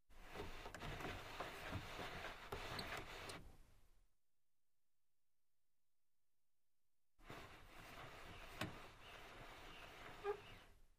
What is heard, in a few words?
Car glass lowered, raised manually